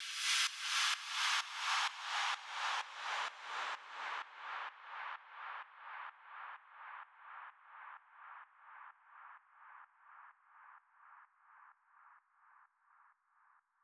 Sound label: 128BPM FX; Vengeance Samples; Neon Kit